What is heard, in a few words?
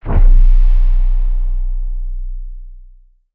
UI Low Health